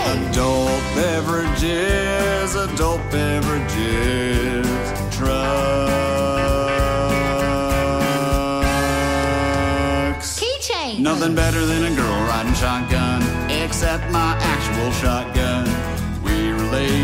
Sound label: Country song